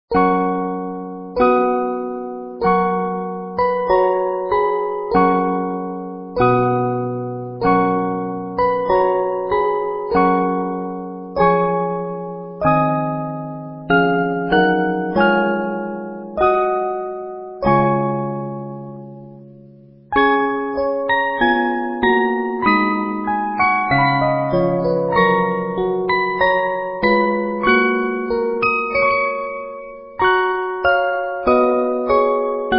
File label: O Du Frohliche, 50-Note Movements